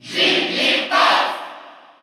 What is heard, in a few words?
Cheer Russian; Jigglypuff; SSBU